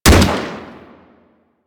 Rifle Shot, Weapons; Drake

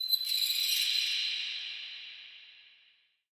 Under City Bat Chatter Distant

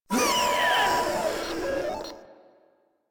Proto Infested Runner Death; Warframe

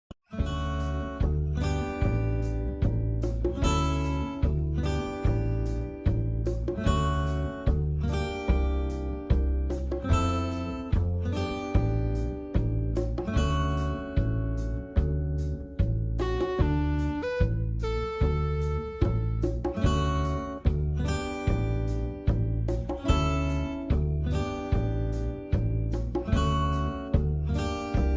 6 AM Music; Animal Crossing New Horizons Music

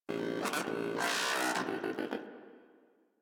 Moa Aggressive Vocal Disagree; Warframe

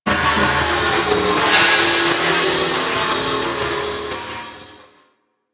Multi Steam Jet Machine; Ambience; Warframe; Corpus Railjack

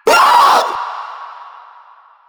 Proto Infested Runner Vocal Short Attack

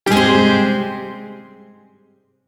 Shawzin Phrygian Chord K; DE; Warframe; Duviri Shawzin

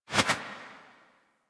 UI Collectible Tarot Card Click Flip; Warframe

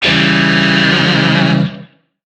Shawzin Phrygian Chord E; Music; Warframe; Lizzie Shawzin

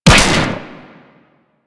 Fire Shot; Snipetron Vandal; Rifles

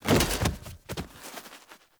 Battle Dog Bodyfall Dirt, Foley

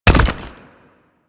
Mech Step Surface Rock; Step Surfaces; Nechro

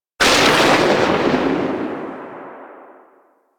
Shotgun Tail; Weapons; Revenant; Warframe; Tenno

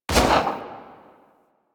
Fire Shot, Weapons; Lato Redux

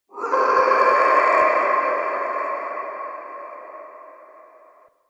Interior Texture Painful Screech; Warframe; Infested Eris; Misc Indoor

